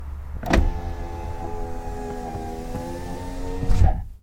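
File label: power window close; car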